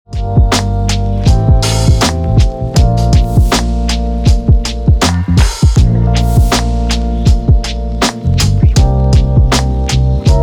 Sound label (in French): exemple music gain plus élevé